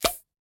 Bounce

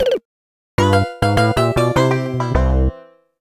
Lose Life; Super Mario 3D Land; E3 2011 Beta; 3DS

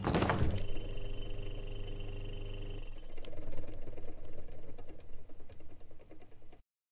Fridge Open; Lethal Company

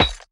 Bounce Metal

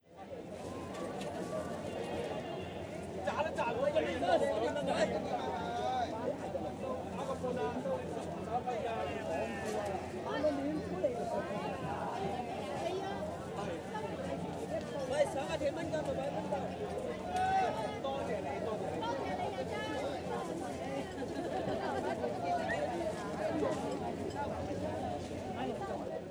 Hongkong City amb filt; Google Earth VR

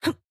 Attack Junk; Jackie

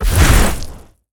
Enemy Flame Size up

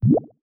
Down; SE Ing Common#10 (SE Puy09 Down)

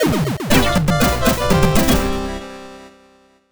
Dies in The Retro Kind of Way; MarioGunner Sound Selection, Vol. 2; Bloo Kid

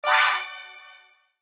Enemy Healer Heal

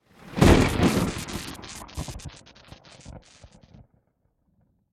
Big Punch Base; Avie Boss BigPunch

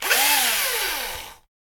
Drill One Shot

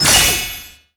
Item Get

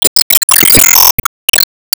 Enemy Woodblock Heal

